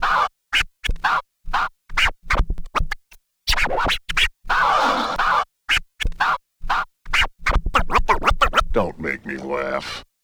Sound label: Scratch